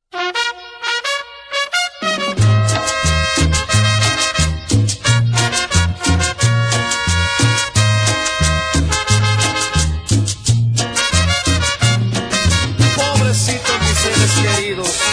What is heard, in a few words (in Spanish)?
Cumbia